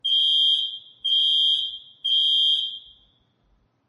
Alarm; The Joy of Creation Halloween

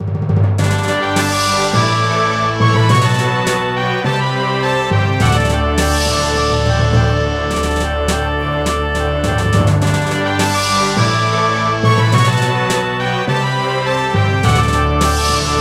Victory; eikan wa kimi ni koushien he no michi

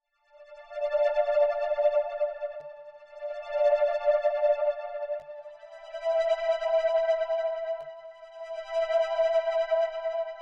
Calm; Back to Bed

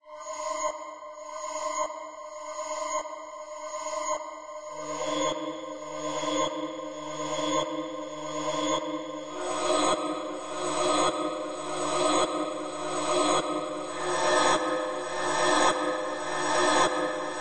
Loop; The Witch's House MV; PS4 Switch Windows Xbox One Xbox Series X|S

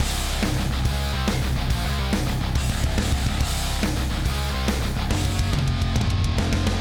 Drums and Jingles BATTLE; Metal Tales Fury of the Guitar Gods

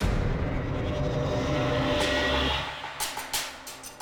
120 Perc Metal Lgt Intro; Unruly Heroes

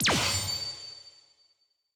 Battle Pose Success

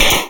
ui, downclick; birthday party bash; wii

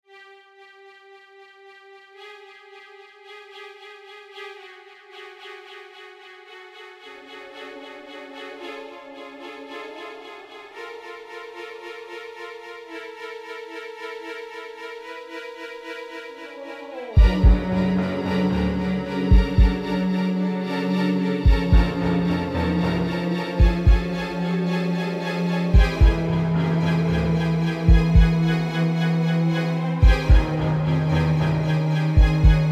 bgm abyss

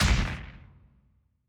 Battle Attack

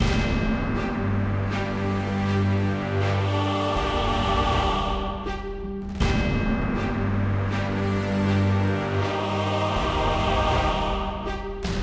General Music Bed; Hall Of Titans

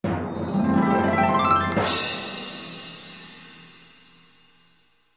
Level Excellent; mahjong royal towers; ps vita; ps4

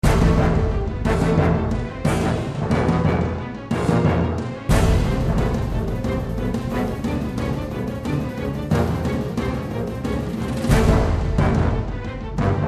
Action

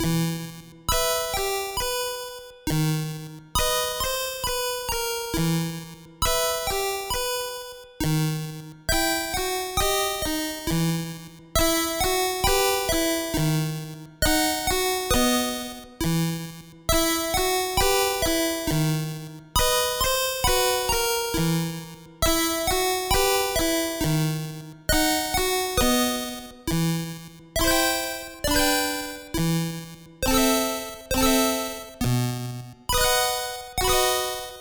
music musicbox

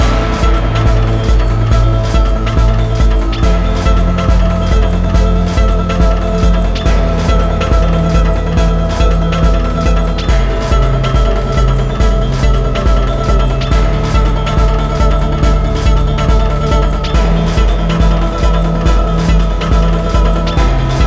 Gamelan techno Medium

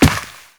rock climb, step

